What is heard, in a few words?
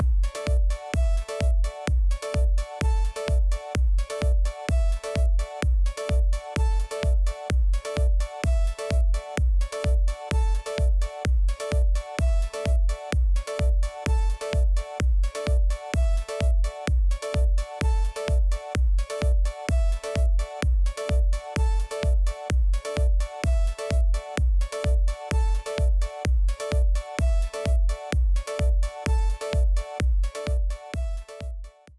lunchtime chat, kawaii; TinyBGM